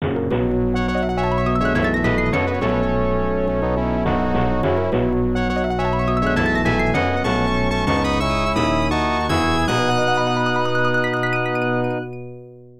Final Boss Clear; the king of dragons arcade

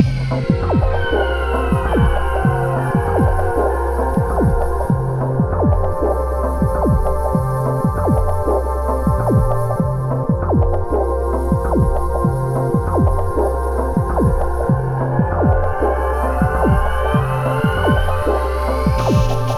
reflection - C - 98bpm

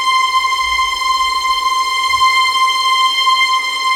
tremolo; Level4 Hidden World

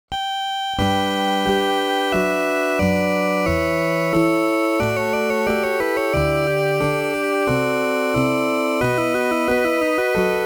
Hymn

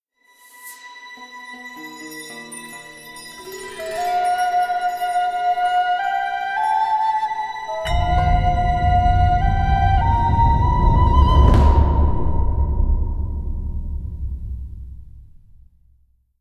Flash Music Stem

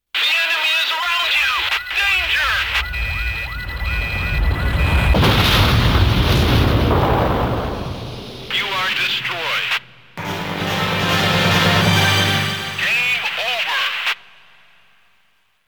Chill Out; Konami Amusement Sounds '94

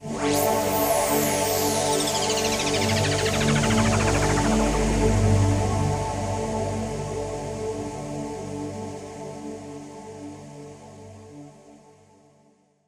Game Defeat; Sprill Aqua Adventures

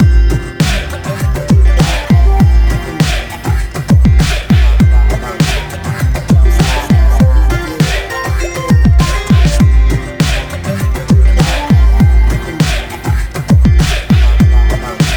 Game Over Modern

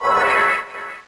Aqua Ring

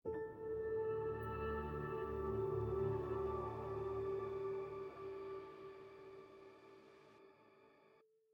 Jingles

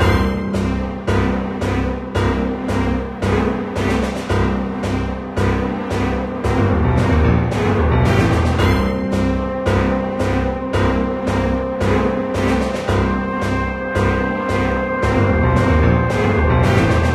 Bgm

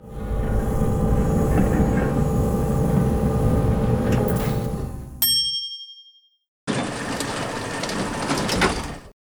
puzzle elevator arrival

